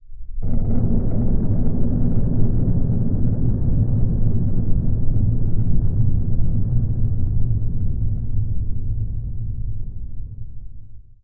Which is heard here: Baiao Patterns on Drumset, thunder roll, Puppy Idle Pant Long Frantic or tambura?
thunder roll